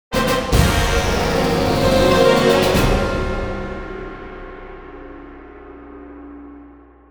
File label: Lose; 1942 joint strike xbox 360